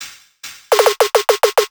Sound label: Riddim Drum Fill (140); Drum Fills; Ghosthack; Riddim Essentials 2020